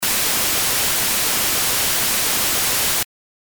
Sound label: white noise; bricks in motion sound library